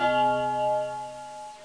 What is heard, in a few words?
chrch bl; Multimedia Cube; bells